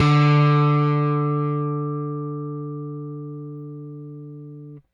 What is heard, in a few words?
Rick F, Rickenbacker Bass; Airwindows